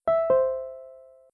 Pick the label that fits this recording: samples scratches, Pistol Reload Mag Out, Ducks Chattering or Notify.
Notify